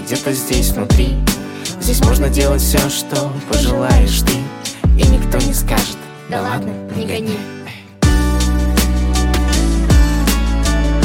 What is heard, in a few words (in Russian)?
rington